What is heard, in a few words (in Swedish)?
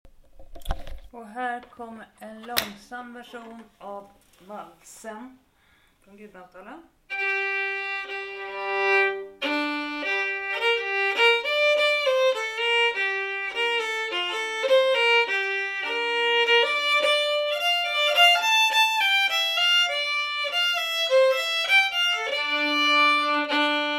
vals gudbrandsdalen långsam